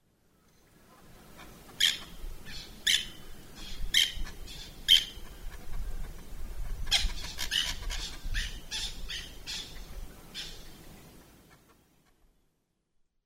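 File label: Black-naped Tern; miyako; koe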